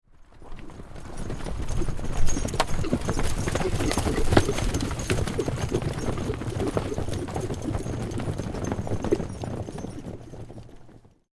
Flock Of Horses Run By On Dirt, Approx 8-10 horses trot past; on dry dirt ground; Horse Sound Effects